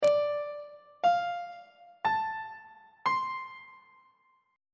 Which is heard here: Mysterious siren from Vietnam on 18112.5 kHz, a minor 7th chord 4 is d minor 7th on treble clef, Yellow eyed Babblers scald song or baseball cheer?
a minor 7th chord 4 is d minor 7th on treble clef